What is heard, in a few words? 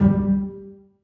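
basses pizzicato f#3; Sonatina Symphonic Orchestra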